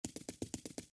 Walk

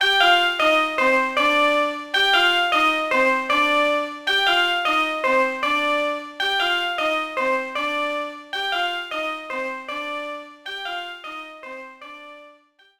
Music Small Failure Loop; Imagine Babyz Fashion; 2009 NDS gamerip

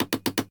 Construct Object

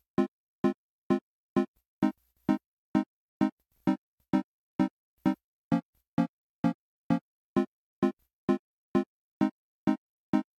Chords; chippy

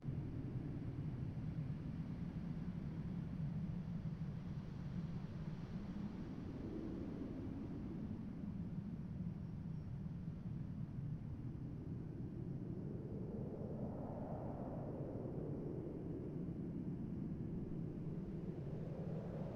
Ambience wind; Content Warning

